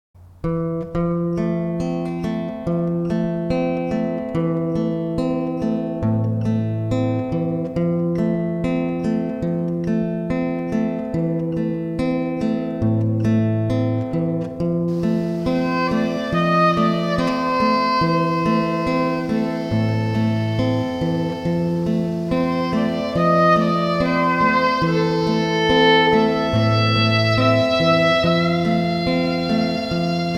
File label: Down minor Nocturne Violin